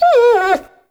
Kubrow Tame Loot Fail G; Warframe

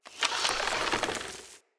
Bow Ranged Charge; Weapons; Player; Bows; Grand Father Bow

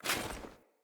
Foley Action Armour Run; Player; Deora Armour

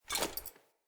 Short Sword Recall; Weapons; Player

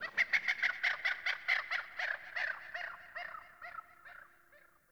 Birds Low, Ambience Plains Landscape; Warframe; Eidolon Plains

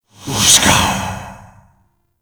Grimoire Alt Fire Whispers; Warframe; Tenno

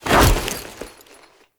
Foley Melee Sweep; Warframe; Kahl

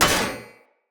Short Sword Medium Impact Heavy Armour

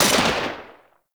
Artillery Mech; Warframe; Nechro Mech; Abilities

